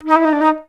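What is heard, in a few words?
flutes D#3; Best Service; Peter Siedlaczek's Advanced Orchestra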